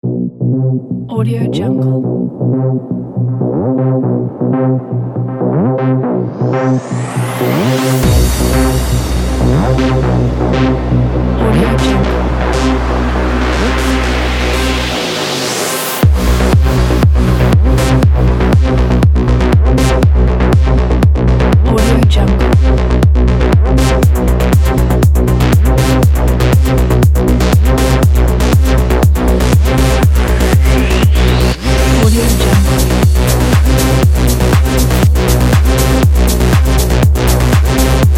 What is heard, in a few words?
Aggressive Techno